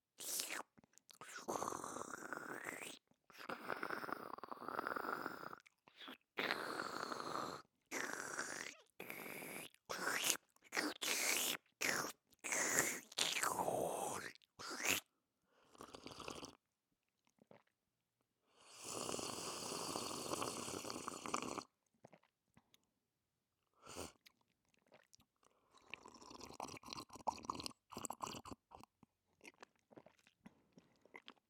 Slurping Sound Variations 1 Only With Mouth 2 With Liquid